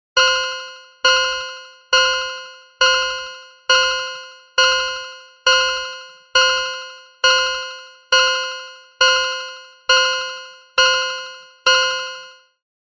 Chime High Pitched, Electronic High Pitched Bell Chime